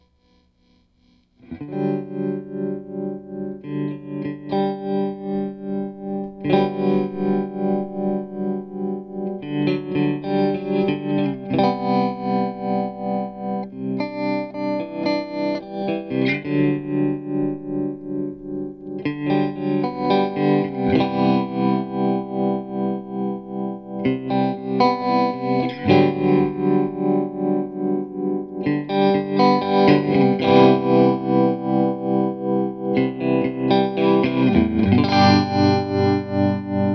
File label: Macht 12 1x12 Combo Fender Stratocaster